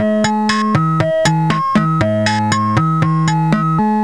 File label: Bossa